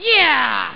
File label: yeeahh; Amiga Soundtracker sample